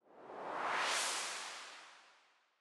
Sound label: swoosh rise short